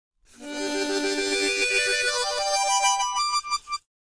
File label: Mouth-organ, Vibrato Glissade, Type 4 - Ascending, Tonic Chord; woodwinds harmonica